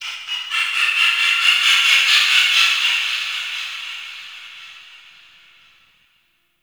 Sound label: Forest Distant Bird Of Prey; Ambience; Warframe; Grineer